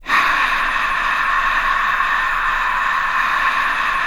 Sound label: CROWD NOISE, VOCAL WIND; ILIO; Vocal Planet; Jazz & FX